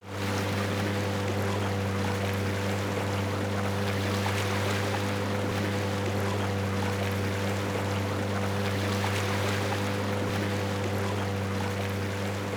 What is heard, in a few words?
Boat Moving